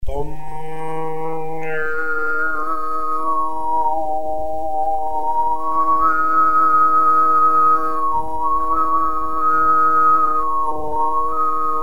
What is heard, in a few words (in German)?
Oberton